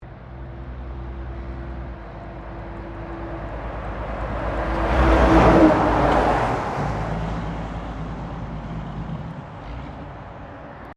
Traffic Semi Trailer Pass By; General traffic sounds; Traffic and Cars

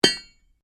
ANVIL IMPACT HEAVY, Small anvil impacts; Metal; Materials

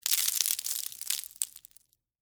Texture, Debris, Spill, Sugar, Cone, Waffle

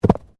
Body movement Fall Medium

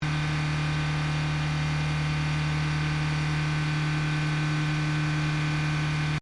HYUNDAI ACCENT 5000RPM EXHAUST, car; Hyundai hire car; Vehicles

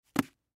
Wallet Toss, Wallet Leather Toss Table; foley; Drop Sound Effects; Objects; Blastwave

